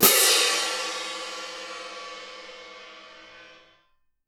CYM F -R, Percussion; Miroslav Vitous - Percussion & Harp

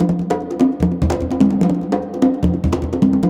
CONGA BEAT; Ethnic; Zero G - Ethnic

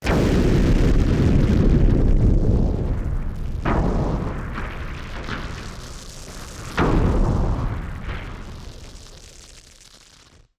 Party Popper FX

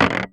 Percussive Hit, contact mic; musicradar; contact mic madness

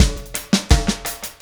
Static Beat Intro; Sonic Foundry (Sony Creative Software); Indie Rock Anthems; Session 13 - Static

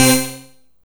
Synth Hit C; Zero-G Trance Inducer; 90 s Sample CDs

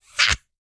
GRUNT, MALE HUMAN; Spectrasonics Vocal Planet

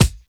E-KICK; Akai MPC2500

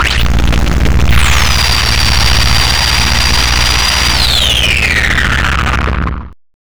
META FX, Hard & Loud Techno; USB Soundscan vol.01; AKAI

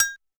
Hi Agogo, TR626

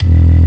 ORG VK, B-3 ORGANS Vinta Keys B3

